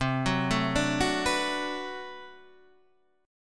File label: B Minor 7th C, chord